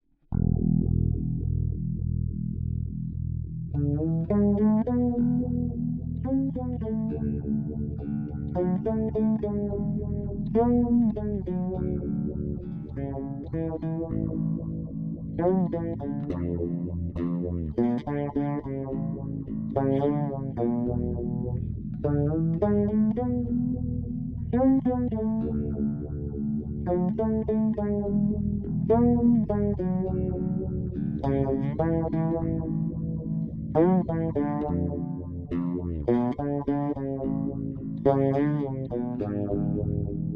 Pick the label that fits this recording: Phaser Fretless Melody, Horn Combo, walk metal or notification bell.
Phaser Fretless Melody